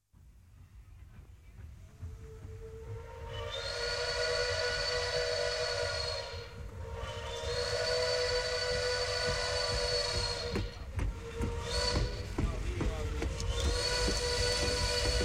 TRENO PASSAGGIO CON FISCHIO

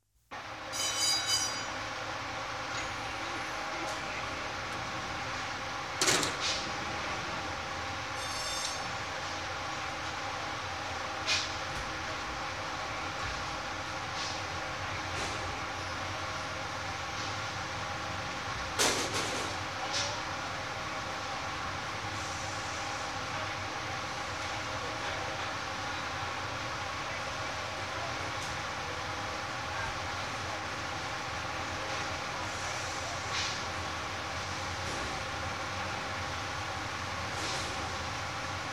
OFFICINA FABBRICA

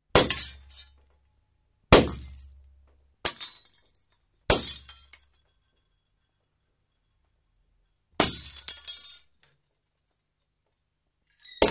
VETRI ROTTI

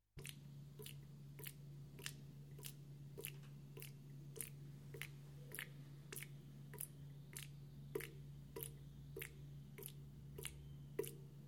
ACQUA GOCCIOLIO PERDITA

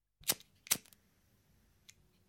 ACCENDINO

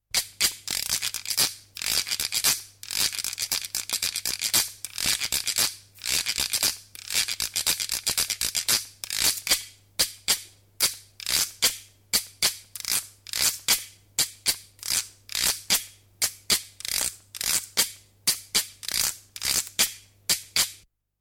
STRUMENTO GRATTA CHECCA

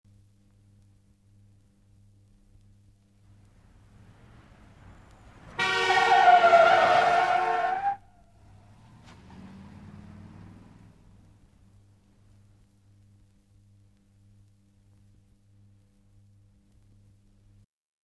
CLACSON AUTO CON FRENATA

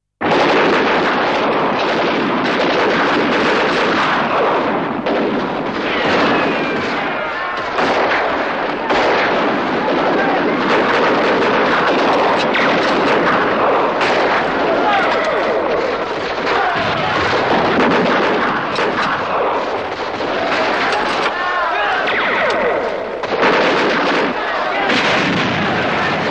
SPARATORIA GUERRA

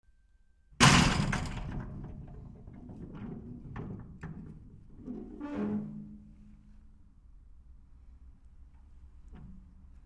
PORTA CHIUSA

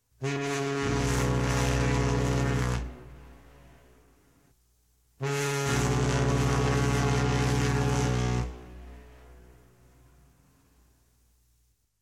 NAVE FISCHI PARTENZA

